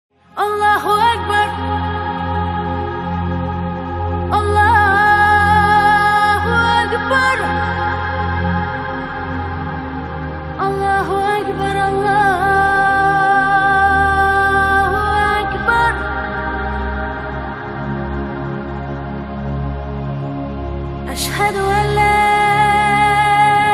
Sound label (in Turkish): allah u akbar Telefon Zil Sesi